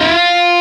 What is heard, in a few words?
bend full